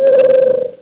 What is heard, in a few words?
tribble